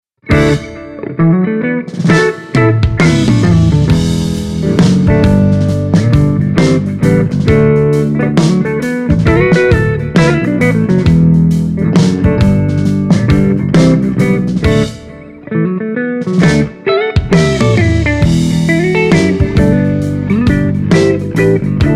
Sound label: Eminence Legend GB128 Les Paul